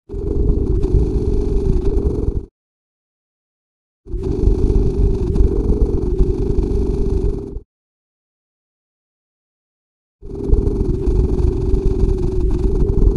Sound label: peak creature crooning loop; Hollow Knight: Silksong